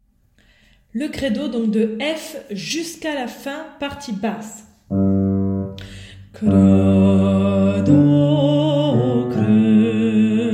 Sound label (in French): basse; Credo f à la fin